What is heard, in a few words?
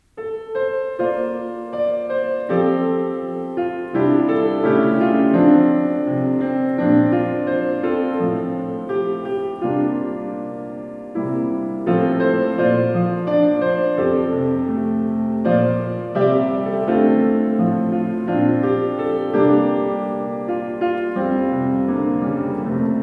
Piano